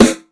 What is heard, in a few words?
sharp snare drum sound e key; snare drum samples